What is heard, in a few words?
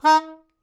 Melodica Short; Short Notes Chromatic